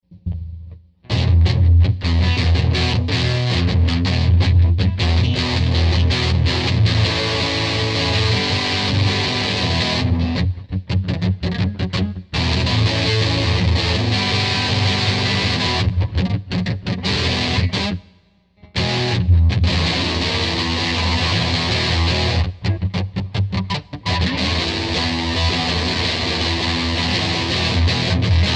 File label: electric